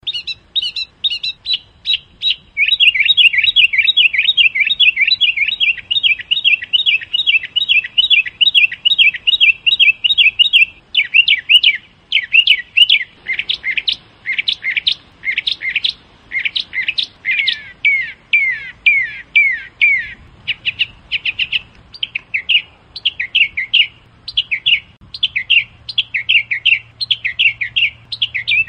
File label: Birds